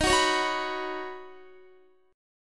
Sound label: D sharp Minor 7th Sharp 5th, Guitar; strummed; JGuitar